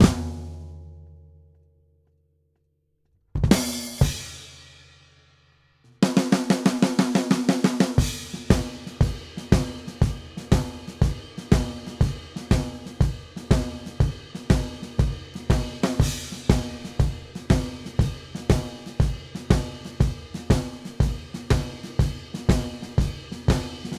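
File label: Blues Drums Breakdown; Digidesign; Pro Tools Essential Loops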